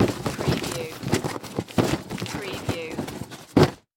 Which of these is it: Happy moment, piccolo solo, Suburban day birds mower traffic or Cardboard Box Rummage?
Cardboard Box Rummage